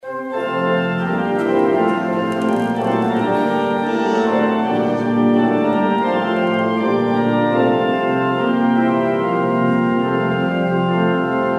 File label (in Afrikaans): Gemeentesang